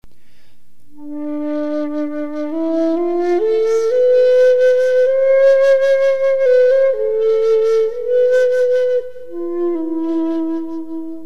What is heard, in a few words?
Meditation flute; Meditationsflöte